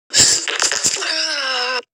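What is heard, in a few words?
double fart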